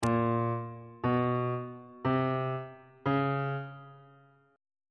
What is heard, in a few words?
g sharp 3rd interval pitches on bass clef